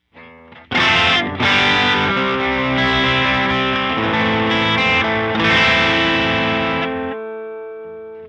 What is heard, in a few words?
Eguitar PRE